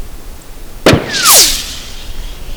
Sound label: 50 SHARPS 1100 YDS; sound of bullets